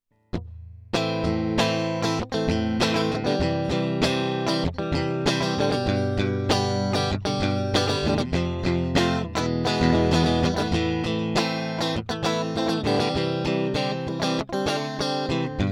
E Gitarre; MOTU 828ES

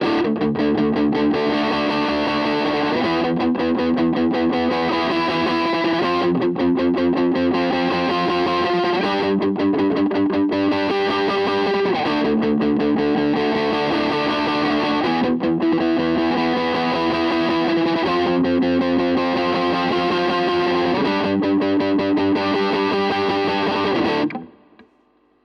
Harley Benton Vergleich HB Zerre; Maybach

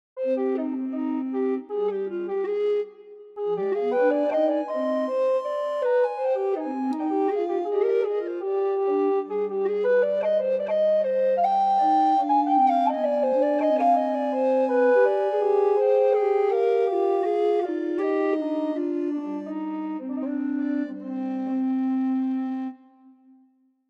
Renaissance Flöten

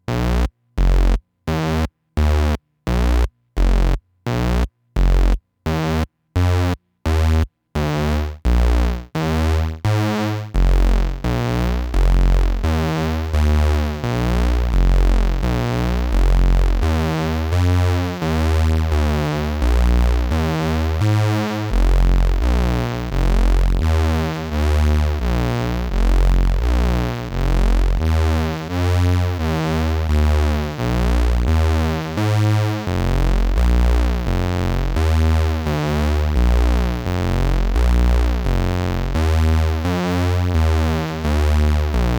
Dubreq Gen R 8 ENV und RingMod

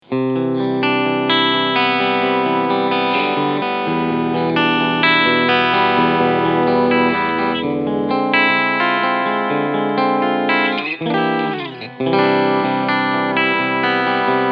Clean StegSC

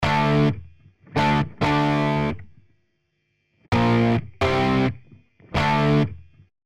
E Gitarre Dist OPAMP Hairier Guitar

